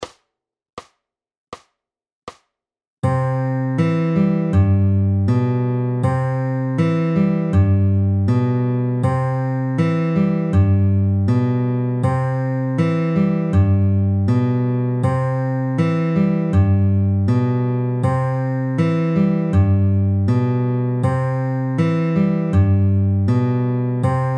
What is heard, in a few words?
Trav SLOW, travis picking